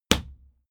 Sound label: fight impact sweetener hard; krieg-waffen; nahkampf; soundpool